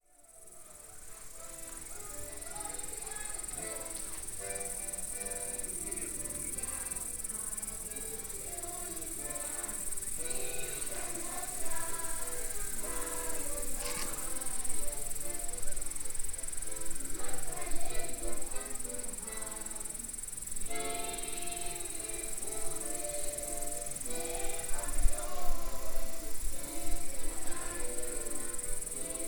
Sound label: choir; gagino